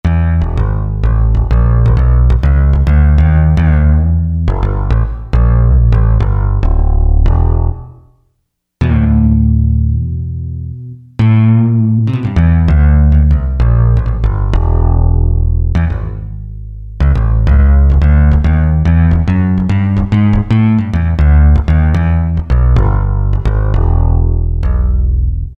Round Bass, Korg MSC-6S Fretted Insts; Fretted Insts 1

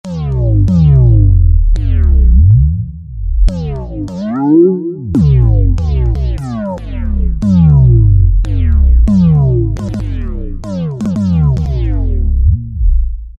Nu Skool Drop, Korg microKORG; Factory Voices

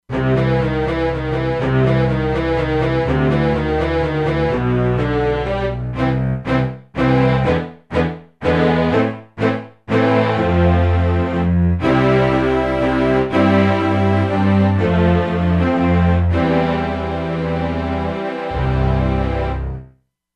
Marcato; Factory Presets; E-mu Proteus-2

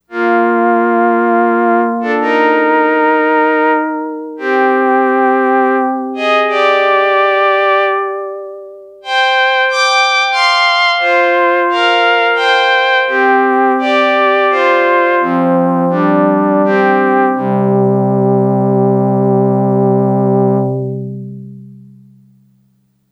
5th String, Yamaha DX100; Factory Patches

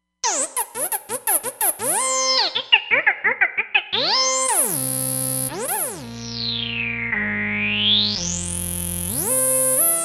Reso, Roland Fantom XR; SBF Reso

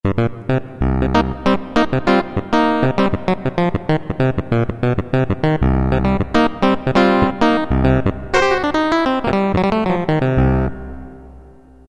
Clavi, Roland MT-32

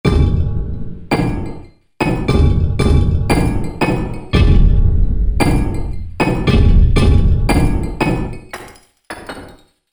Effect Per, Korg MSC-1S; Korg MSC-1S Variety

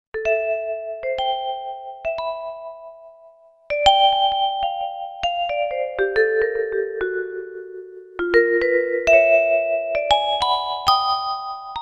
Vibes, Korg MSC-1S; Korg MSC-1S Variety

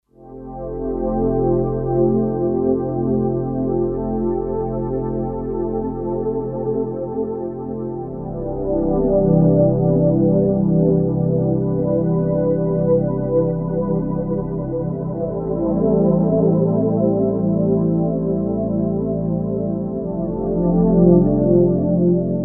Real Pad, Kawai K5000S

